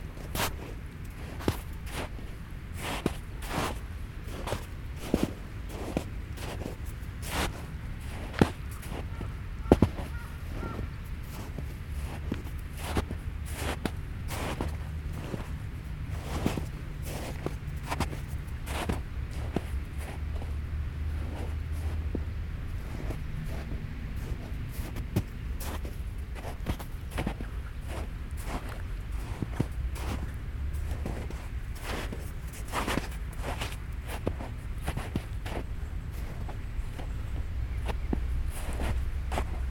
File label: snow